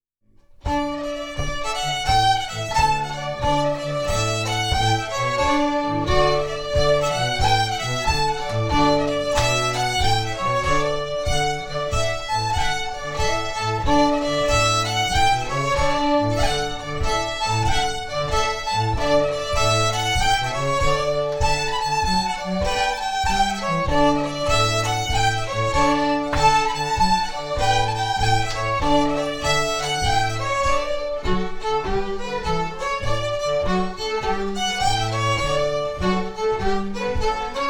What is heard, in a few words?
Quick Step; Lord Dunmore's Quick Step; WINTER JINK 2015; Jink & Diddle Rally Music